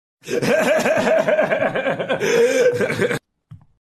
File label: laugh; seth rogen